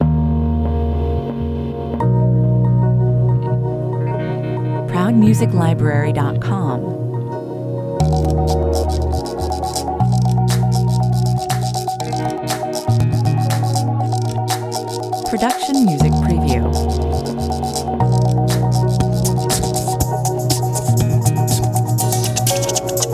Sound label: ambient mix 120 bpm